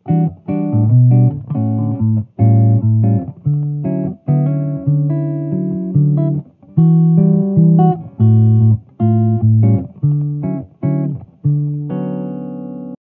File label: Jazz before